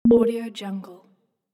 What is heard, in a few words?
bonus game